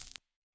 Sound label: machine, electro hits; Hip Hop; style kits